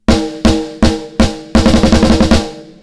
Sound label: Snare harmonics out